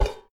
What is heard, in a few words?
Shield Hit